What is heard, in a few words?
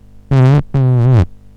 tone EGG